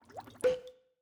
ambience room alchemy lab potion ready

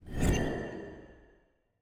environment mob despawn

